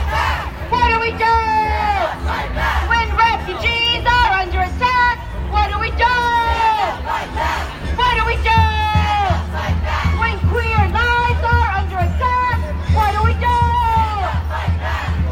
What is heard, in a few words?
womens march